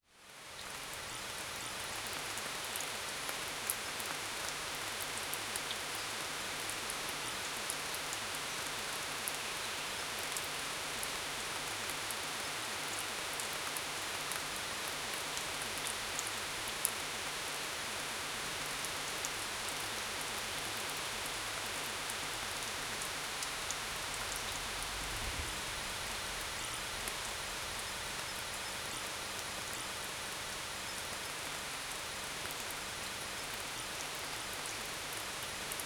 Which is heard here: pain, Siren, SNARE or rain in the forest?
rain in the forest